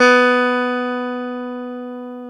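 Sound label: E 12 String guitar; Club-50 - Foundations Roland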